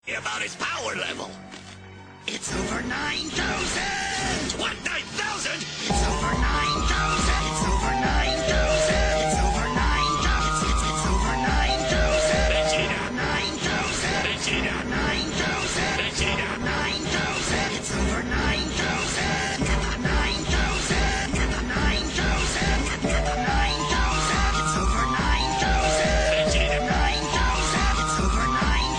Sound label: ringtone; dbz sparta